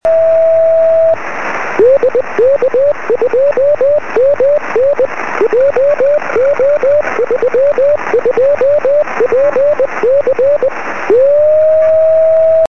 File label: beacon 23cm; sm7lcb